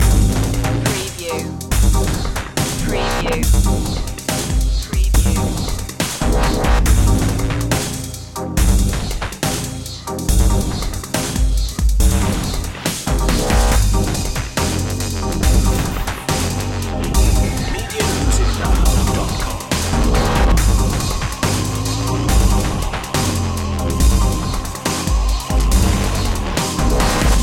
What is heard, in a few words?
ice ragga loop